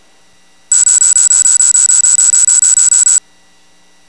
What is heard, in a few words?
Unlock; Shopping cart